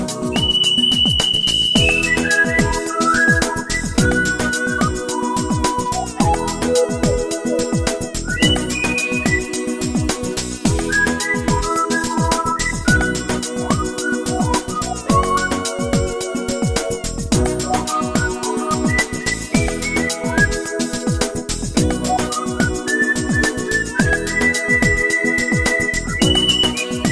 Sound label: Classic Funk